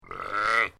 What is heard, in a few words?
Animal Sheep Adult, Single adult sheep baah, ewe bleat, deep voice.